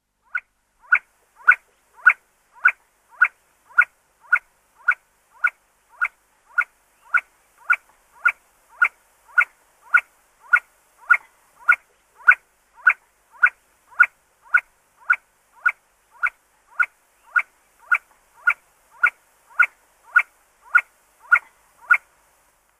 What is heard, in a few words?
See-see Partridge male display